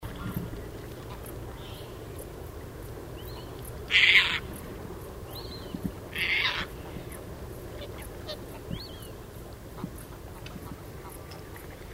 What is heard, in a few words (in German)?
Raubseeschwalbe; Ammersee LL; Susanne Rieck